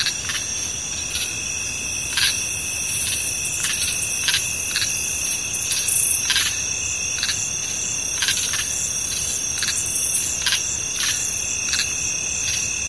frogs loop, forest; ambient; source engine; ep2

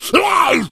hunter shred, attack voice

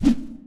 foley whoosh; player

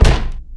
wood crate impact hard; Source Engine; video game; physics

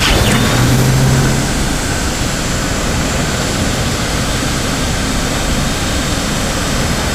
rocket pack boosters loop; tf

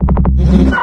dog phrase; npc; source engine; episodic

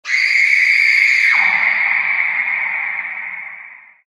female scream, halloween; Source Engine; tf

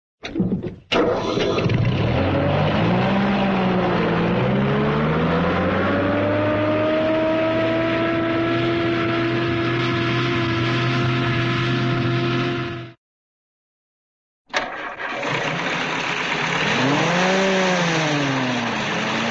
jeep start and pull away, truck; cartoon

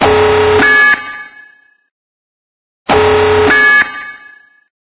base alarm loop; ambient; source engine; ep2; outland

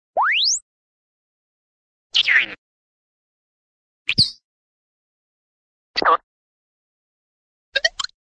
squeak, cartoon little whistle up

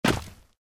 taunt flip land; video games; source engine; taunts

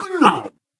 ballbot hot laser

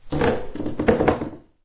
wood creaks; ambient; Left 4 Dead 2; Source Engine

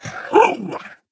ballbot fist pump

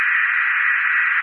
radio random, prison; source engine; hl2; video games

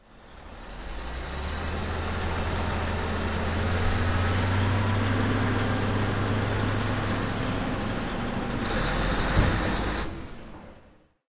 truck drive; ambient; Source Engine; cstrike

